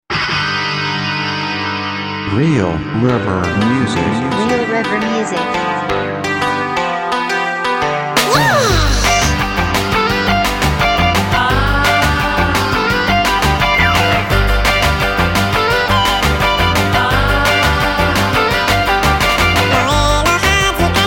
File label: Rock